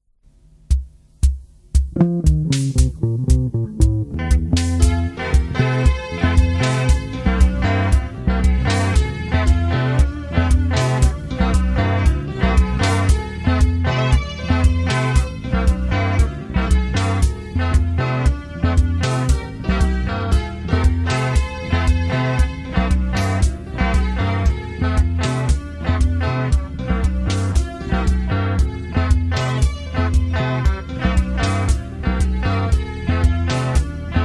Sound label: Choir